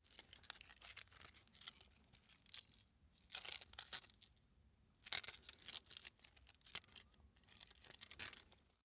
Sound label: small metal handling jingle long; industrie wirtschaft; metall